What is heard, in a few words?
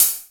trebly hi hat closed sound d sharp key, Royality free hat sound tuned to the D# note. Loudest frequency: 9648Hz